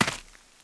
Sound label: dirt; Shadow Man